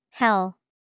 howl

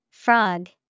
Frog

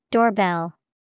doorbell

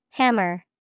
Hammer

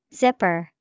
Zipper

